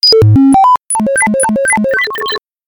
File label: tones